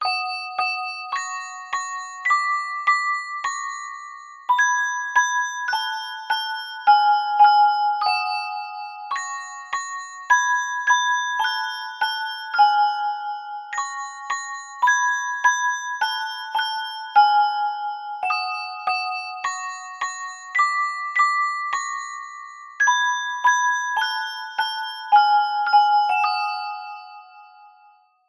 horror music box evil tune twinkle twinkle little star